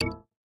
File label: Signal Translator Finish; Lethal Company